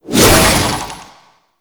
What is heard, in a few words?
Shield And Sword Charge Throw; Warframe; Grineer; Mortifor